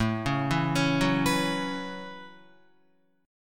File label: A 6th Add 9th A down Guitar; A6add9 arpeggiated